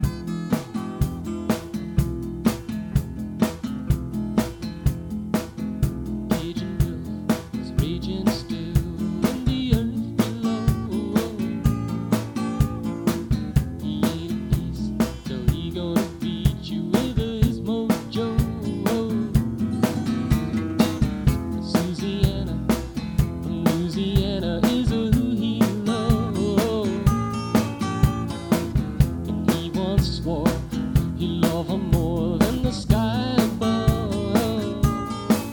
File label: Cajon; VoxengoDrumService